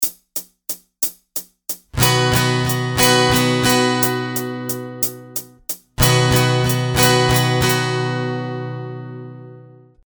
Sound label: 6/8 Time, capo 4; Ultimate guide to strumming book